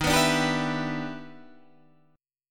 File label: E Minor 7th D sharp; strummed